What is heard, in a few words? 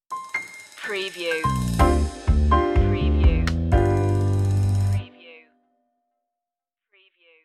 carefree day out stinger